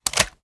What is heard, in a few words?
reload empty xxmag mag out; gmod; iw8; uzulu